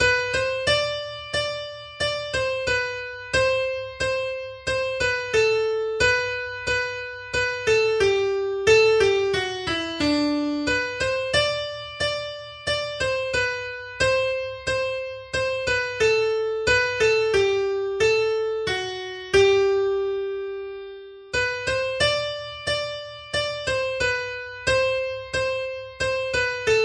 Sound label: slow; Rubadub